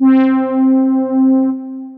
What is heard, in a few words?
OB Centric Brass